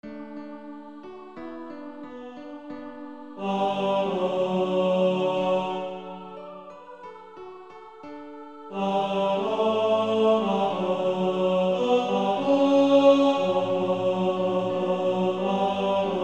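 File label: with Piano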